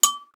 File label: ding